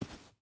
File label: footstep boots sand walk; Rust